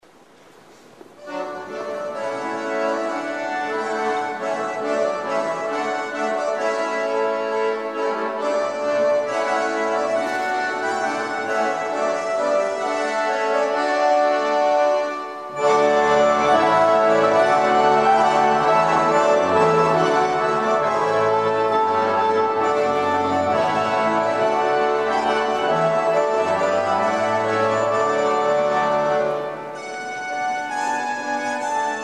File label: Trumpet Voluntary